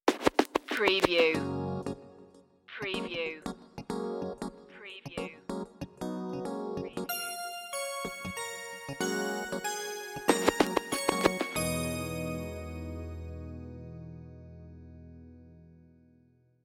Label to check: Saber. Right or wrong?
Wrong